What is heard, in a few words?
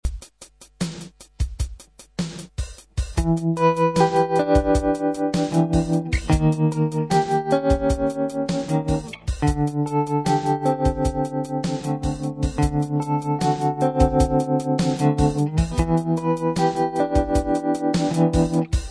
rp200 tremolo